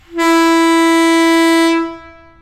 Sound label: Leslie RS Single, horn honks